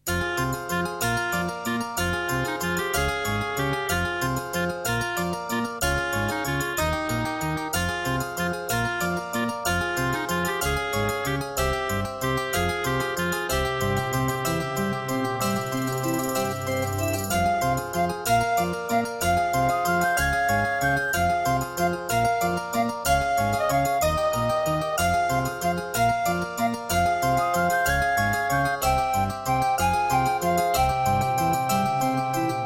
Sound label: Track 6, Aztech Sound Galaxy Wave Power; Death Gate